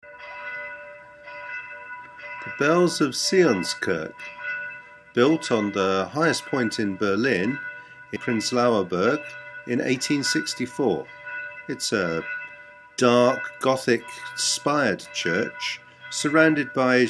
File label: bells and bawls